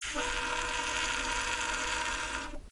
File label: sax res